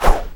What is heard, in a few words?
preattack; dota; bloodseeker